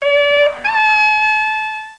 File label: computer win; MIND'S TIC TAC TOE